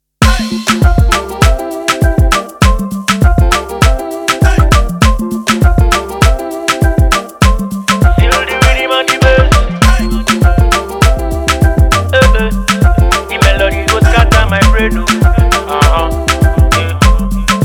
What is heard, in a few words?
RNB